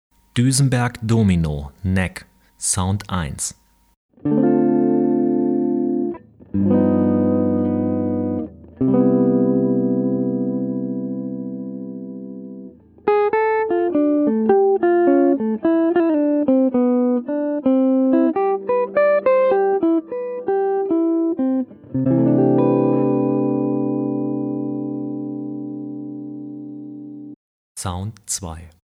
Duesenberg Domino Neck Pickup, Jazz & Swing Sounds